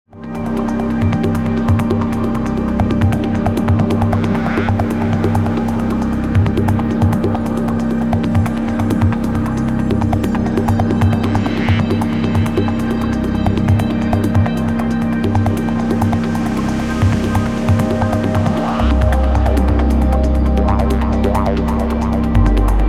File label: ambient flow dub